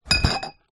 beer bottles full in cardboard carrier pick up from ground; zapsplat